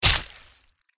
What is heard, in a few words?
mini dragon attack contact bottom